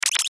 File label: DOUBLE CLICK SQUEAK; Multimedia; Blastwave